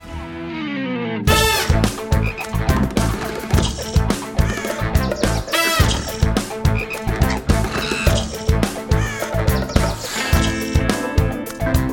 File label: Accompaniment